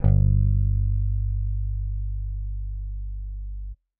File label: Elect Bass Palm G2; Synthetic's Serum Collection